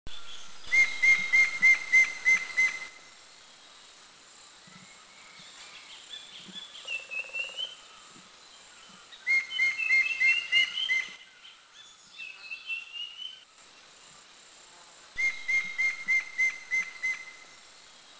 Grey-headed Woodpecker; Grey-faced Woodpecker; Mishmi; India

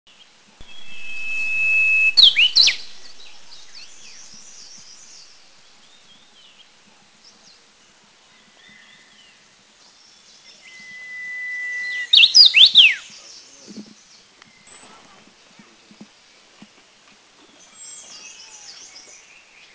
Brownish-flanked Bush Warbler, India, Dirang

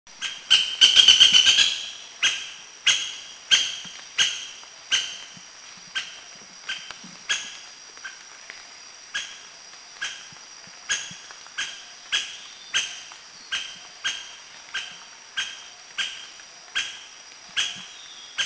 Streak-breasted Woodpecker; Thailand; Krung Ching

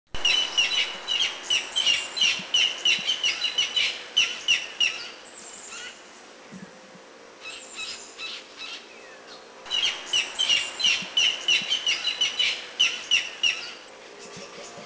Barred Rail, Philippines; Subic; Barred Rail en-route

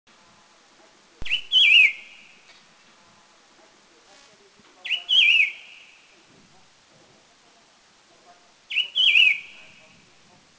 White-whiskered Laughingthrush, Taiwan

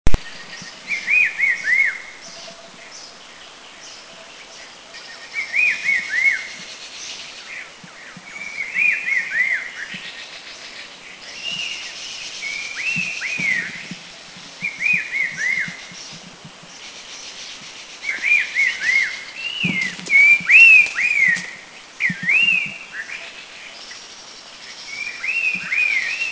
Red-faced Liocichla, Thailand

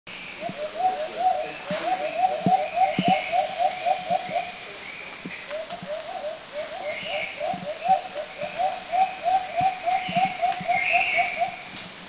Philippine Coucal; Philippines

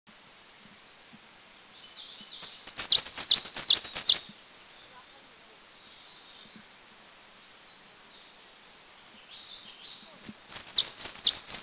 Blyths Leaf Warbler, India, Dirang

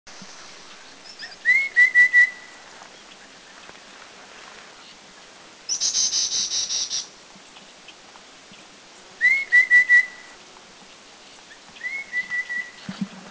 Rufous-capped Babbler; Stachyris ruficeps praecognita; Anmashan; Taiwan; common

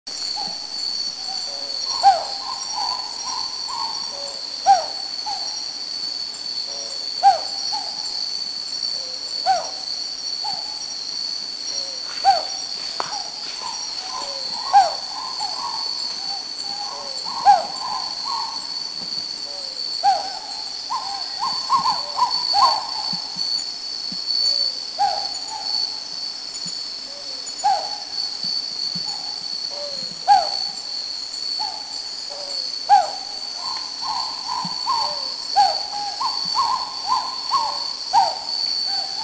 Philippine Hawk Owl Subic; Philippine Hawk-Owl Ninox philippensis Country endemic; Common at Subic

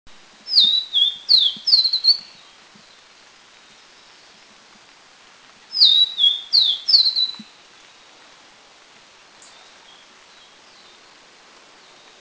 Vivid Niltava, Taiwan

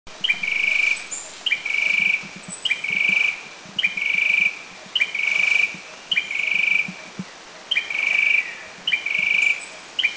Philippine Tailorbird; Philippines

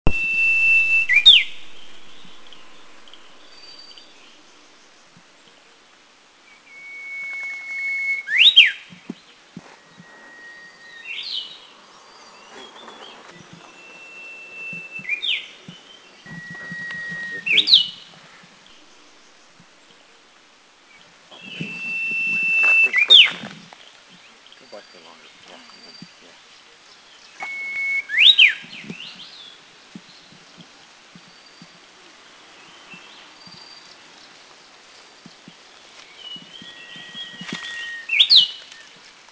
Brownish-flanked Bush Warbler Anmashan; Cettia fortipes robustipes; Taiwan; Seen well at Anmashan

